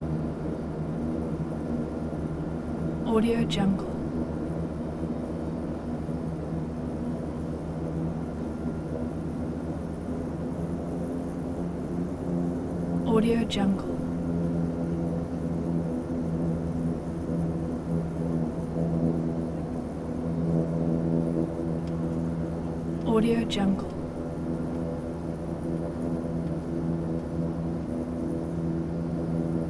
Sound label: c2a greyhound cod turbo prop plane interior; prosoundfx